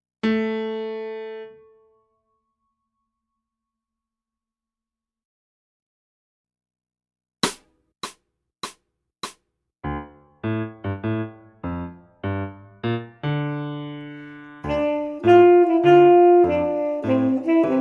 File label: Sax T Jazz